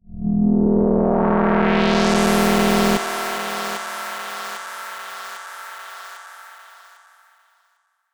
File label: Delayed Synth FX